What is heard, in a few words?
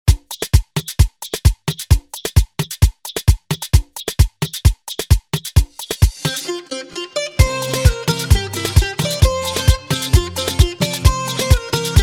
remix intro 131 BPM